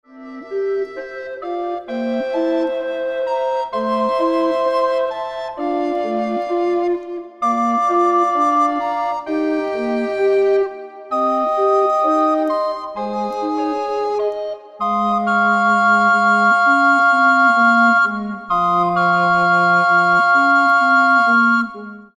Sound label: Recorder Ensemble